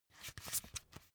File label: magazine flip back soft